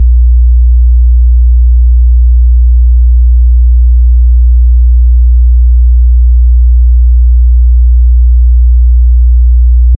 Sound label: sound of om at 417hz, spiritual